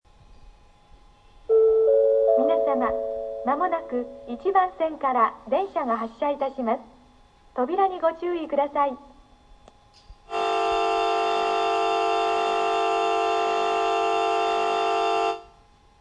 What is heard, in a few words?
izumisano bell; 1番線発車放送＋出発時機合図; nankai